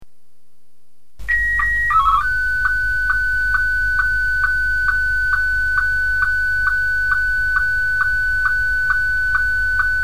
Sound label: sstv